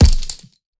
Punching Perc, Straight Glove (Chain); 99Sounds; Punching Percussion

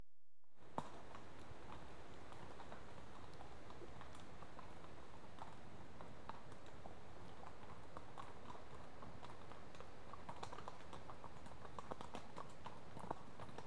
One horse approaches at walk, dep; Horses; BBC Sound Effects Library

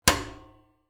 mechanical clonk

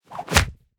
DESIGNED GRAB BLOCK THROW; Lethal Blow

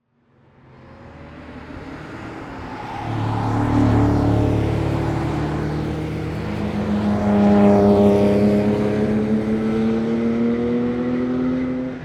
Pass-By Sports-Car Multiple; SoundBits

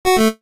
jingles NES; Kenney's Sound Pack; Music Jingles

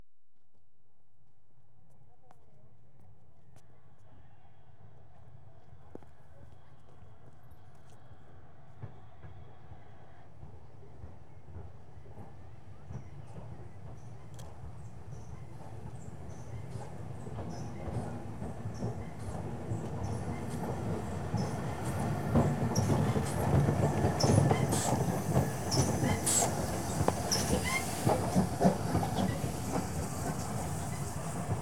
Train Arrives Right Doors Open; BBC Sound Effects Library; Age Of Steam

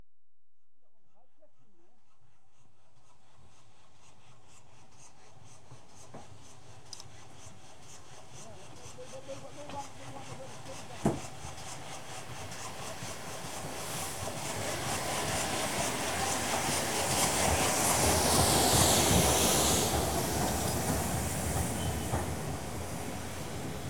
Train Arrives Left Doors Open; BBC Sound Effects Library; Age Of Steam

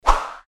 Fighting Swing Sound; FXHome

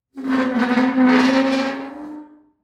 Squeaks and Moans, Just Metal; SoundBits

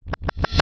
Tech, Weird Side Samples

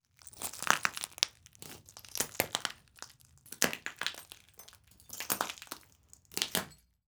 Glass; Nikko Barrera-Amaya

